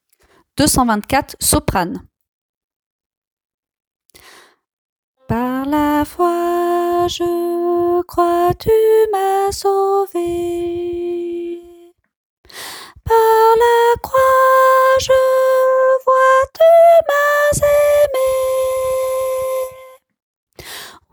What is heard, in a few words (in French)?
soprano